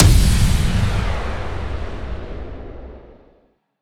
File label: impact; osu